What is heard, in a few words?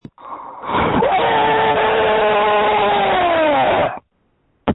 screams